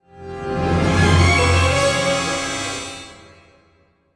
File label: battle, music flourish